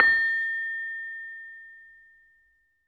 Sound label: LAMEL A5 -R; USB Soundscan vol.60; AKAI; LAMELOFO